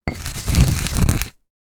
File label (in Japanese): 胡麻をする音 その4